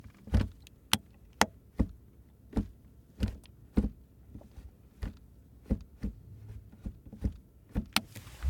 Drive Mode Stick, Jaguar XE SV Project 8; Pursuit Sound

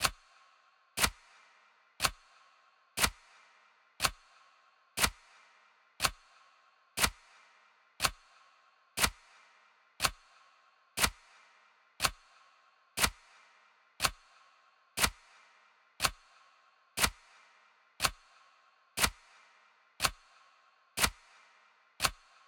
R Clock, Complex Cinematic Tension Sounds; Federico Soler Fernandez

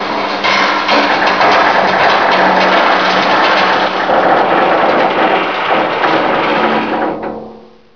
vent scurry hard; hl2; borealis

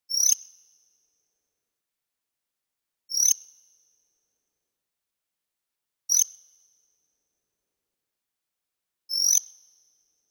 ui Scroll; Kpow Sounds; UI SOUNDPACKS

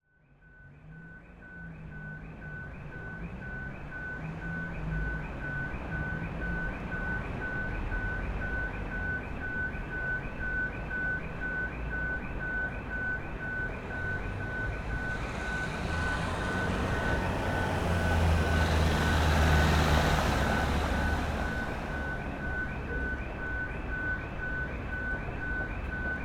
City Street, Light, Ambience, Warning Alarm, Far; Cityscapes And Streets

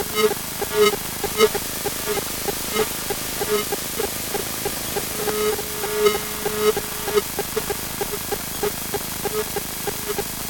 Hybrid Electro Magnetic LOOP; PMSFX; Obscure Frequencies

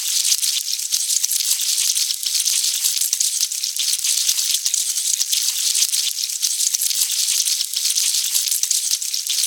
squirm loop, headcrab poison; hl2

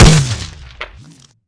footstep, strider; hl2

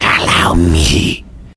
attack; hl2

